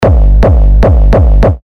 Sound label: Kick G; Ridge; Revealed Terra Kicks